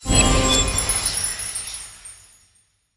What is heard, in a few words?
Song Complete, Shawzin; Duviri; Warframe